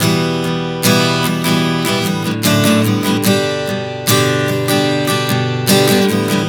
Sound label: Progression 074 Em-D-G-D, Acoustic Guitar, Chord Progressions 074 bpm; Sonic Foundry (Sony Creative Software); Songwriter Acoustic Guitar Companion